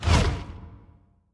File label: erudite shot